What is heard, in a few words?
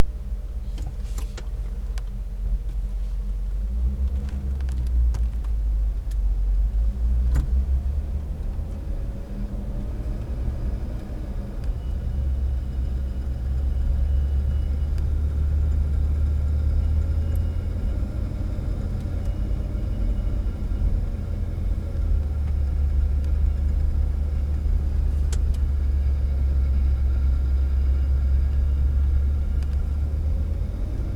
noise, scraping noise when accelerating